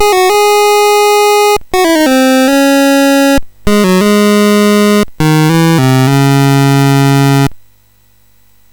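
Quest of Kings BASIC, MOS 6581 SID chip music, games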